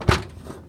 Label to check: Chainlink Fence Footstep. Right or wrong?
Right